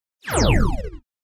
Laser Blast Tremolo

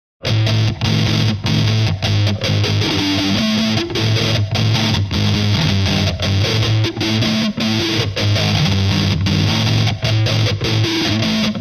Elect Guitar Hvy Rhyt, electric guitar

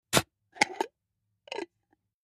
Jam Jar Unscrew Lid, kitchens

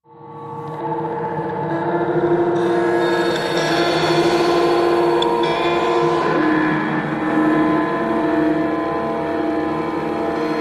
Deflation Machine, machines winches

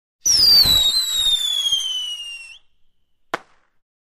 Bottle Rocket, fireworks

